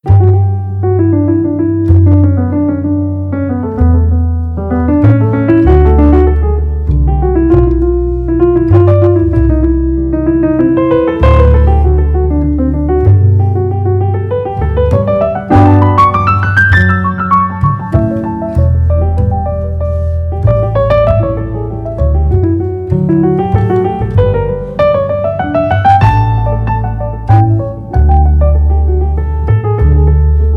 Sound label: string trio improvisation